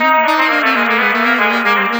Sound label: Dubby Sax; Effected; Sonic Foundry (Sony Creative Software); Jazz Solos and Sections